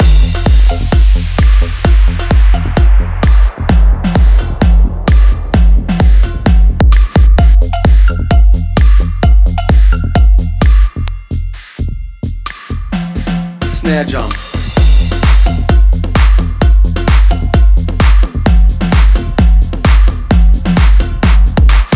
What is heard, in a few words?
Snare Drum